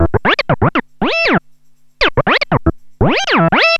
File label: CLAV SYNTH; USB Soundscan vol.32; Roots Disco; AKAI